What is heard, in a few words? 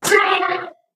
ballbot blown up